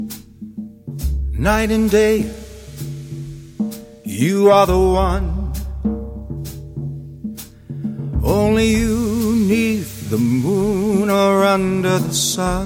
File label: drum and vocal